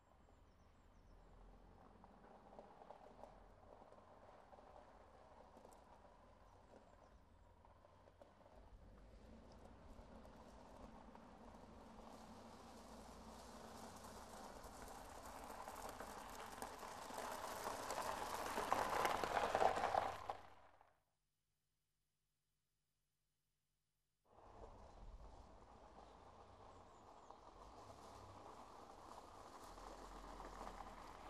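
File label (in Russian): машина подъезжает по мокрому гравию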